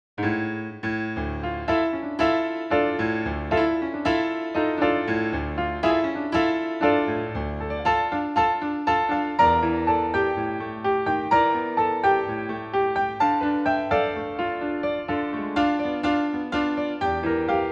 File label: sway piano; arthurbadal